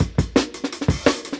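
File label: Jungle Breaks, 170 bpm; Harsh; Original Jungle Breaks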